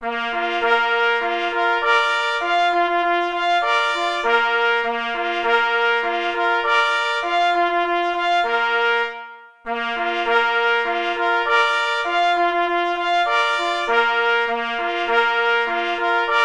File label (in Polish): Marsz; J. Rosner